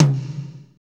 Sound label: TOM, Pwr Ballad; Northstar - Drumscapes Roland